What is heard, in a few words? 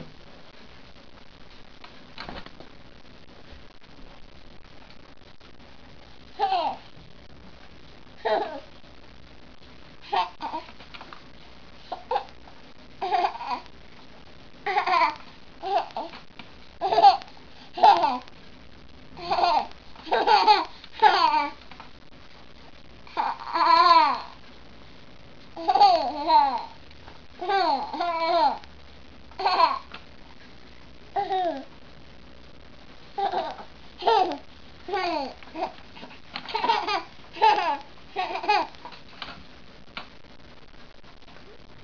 laugh; to hear him laugh